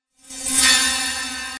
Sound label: Horror